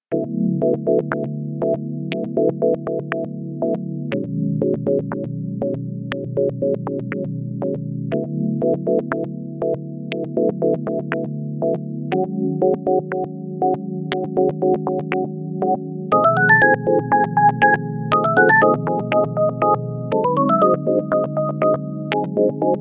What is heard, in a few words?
perc eating a delight